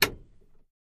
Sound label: Camaro Power Unlock, chevy camaro